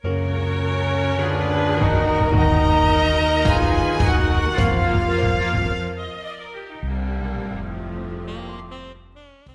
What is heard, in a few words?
Instrumental Foxtrot